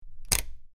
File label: Rotating Switch Clic